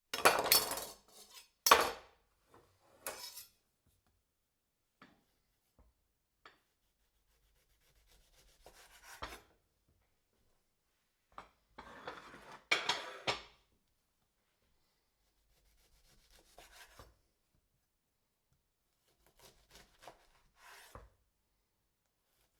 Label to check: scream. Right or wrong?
Wrong